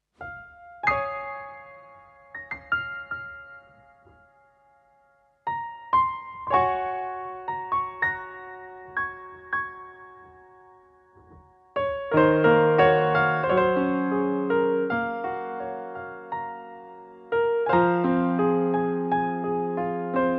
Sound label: Grand Piano